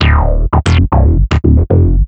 Bassline; Drums & Drones

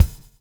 bubble; Spectrasonics - Backbeat

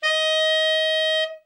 TEN SFT, TENOR SOFT, Sax; Giga Samples Collection

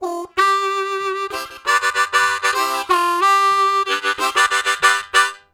Swoop Up, Harmonica, Riffs; Zero G

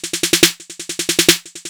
Fill, Drums, Eclectic Electronica

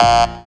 SYN DANCE; Sound & Vision - Gigapack II

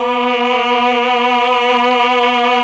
CHOR, Propeller Island Legendary M400; ILIO

